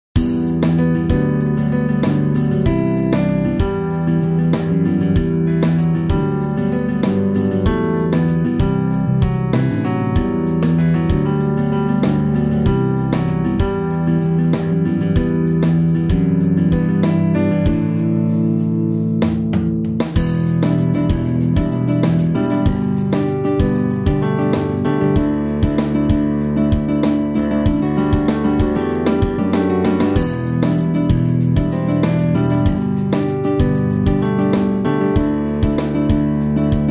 Flat Emotion

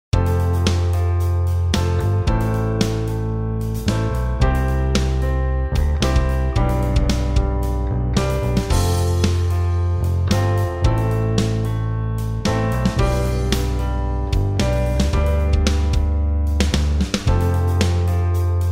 chord inversion with inversion

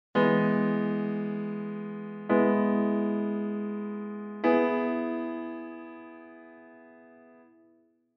e major

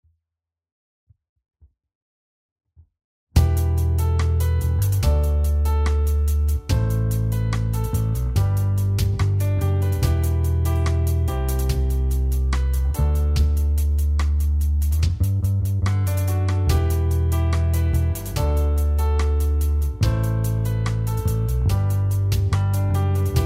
Song Example

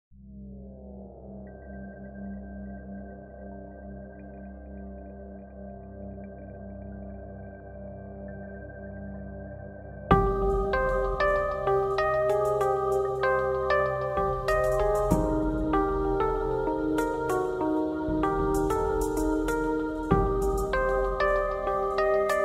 Too Much Drum; Frozen

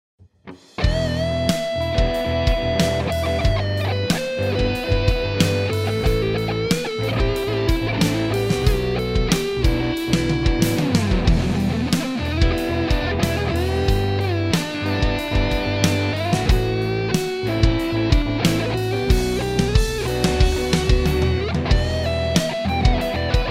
natural minor

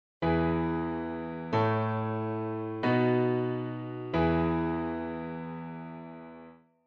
e major sequence

